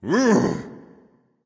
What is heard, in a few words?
grunt lift; smite; xingtian